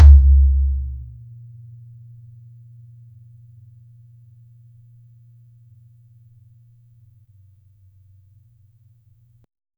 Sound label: mid tom